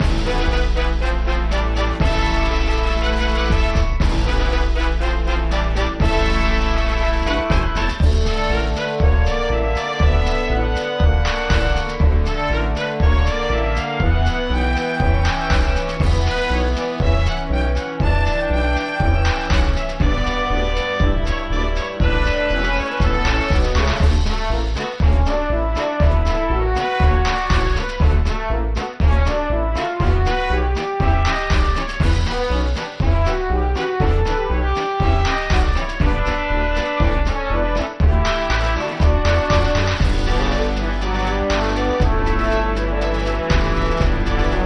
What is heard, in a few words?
Breezy Brass Beat Rhythmic